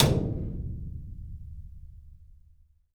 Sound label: Rim Room Brush; One Shots; Essential Percussion